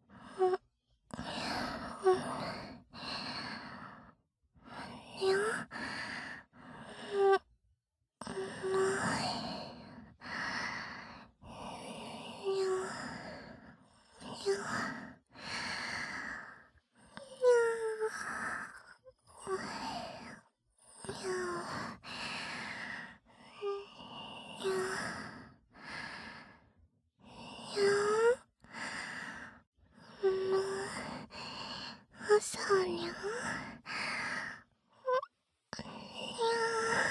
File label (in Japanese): 耳舐め ご奉仕 通常 耳舐め; オナホ猫は耳舐めご奉仕もできます♪